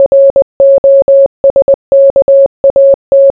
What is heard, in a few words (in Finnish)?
morse